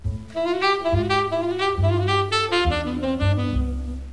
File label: jazz it up program error; PLUS! Themes